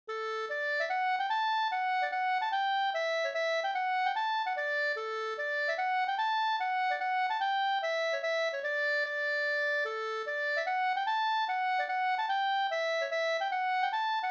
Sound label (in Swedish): hambopolkett